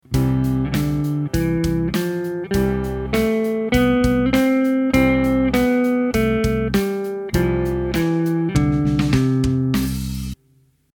major scale

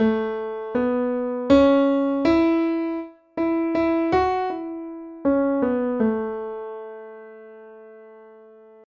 melody

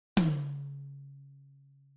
tom high; Tom Tom Drum: High

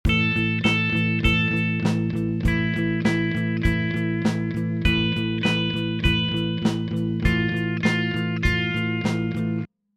minor pentatonic

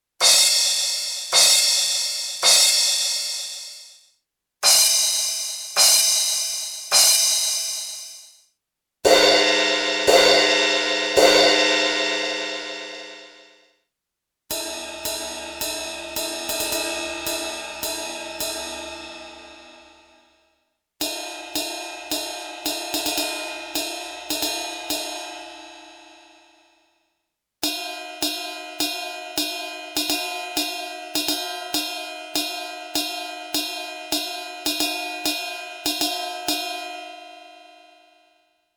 Cymbal Combo

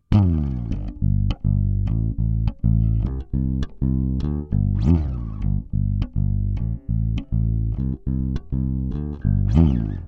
Electric Bass Slide Into Riff

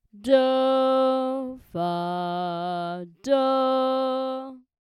t sd t with sd below t; Tonic-subdominant-tonic, with subdominant below tonic

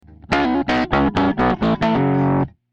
Asymmetrical Clipping

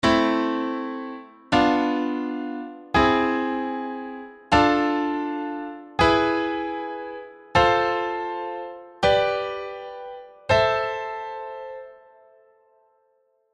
Harmonic Minor Chords

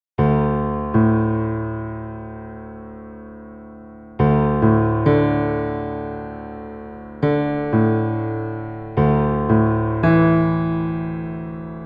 Modal Improv

